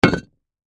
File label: Impact Rock Lid Large Slate; Rocks; Sound Effects:Materials